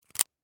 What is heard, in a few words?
Mic Cable Connect, Technology, Mic, Cable, Connector, In, Click; Microphones; BlastWave